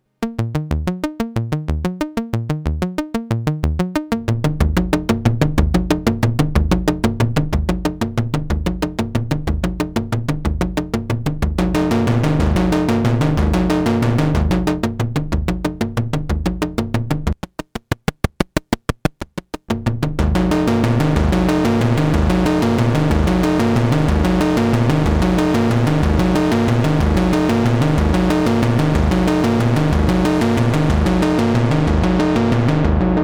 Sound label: LPG Arpeggiator